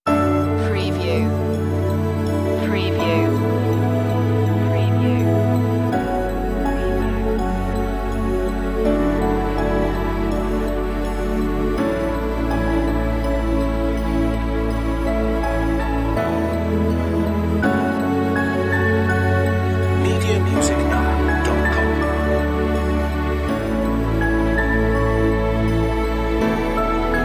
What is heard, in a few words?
true reflections piano lead